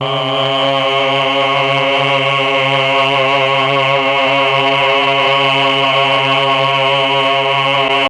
CHOR; ILIO; Propeller Island Legendary M400

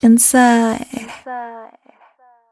f mel c, fem Vocals

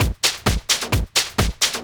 groove, DRUMLOOPS

